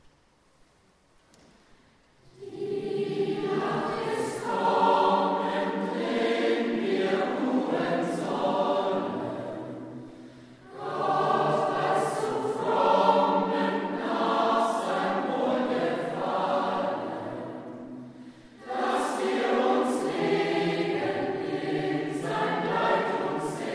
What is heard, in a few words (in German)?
Chor Unterstufenchor; Jubilaeumschor; Die Nacht ist kommen; Adam Gumpelzhaimer